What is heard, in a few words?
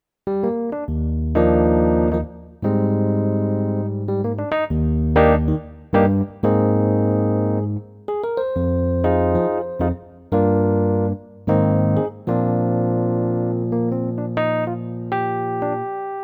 FP-50 Tremolo EPiano